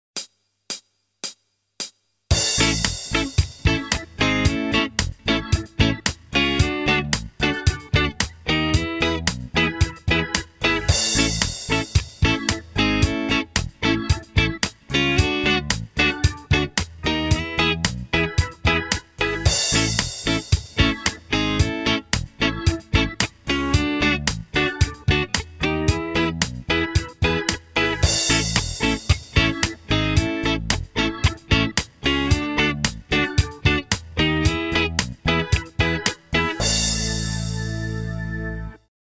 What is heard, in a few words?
Rhythms